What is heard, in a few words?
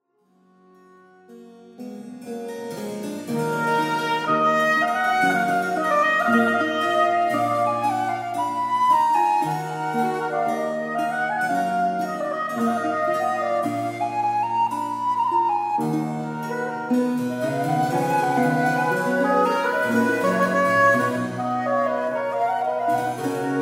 Sonata a 3 in G major II Andante